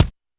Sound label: kick; Ableton Foundation FreePacks; Foundation Drums